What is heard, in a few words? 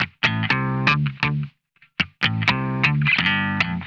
RICKETY OCT, Funk Guitar; Zero G - Funk Guitar